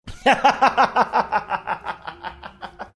laughing

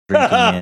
chuckle

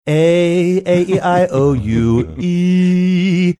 singing a a e i o u e

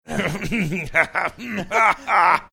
making faux throat clearing laughing

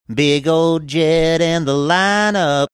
singing

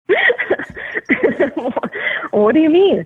laughing saying what do you mean

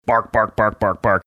bark bark bark bark bark

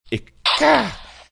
mimicking sharp shot sound

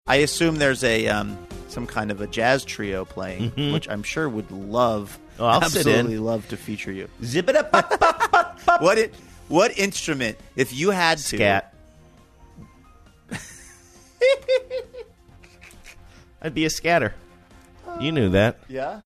scatting; when asked what instrument scatter

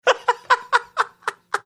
laughing

